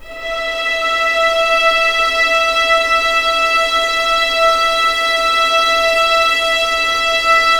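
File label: E5 legato mf violins L; East West; The Ultimate String Collection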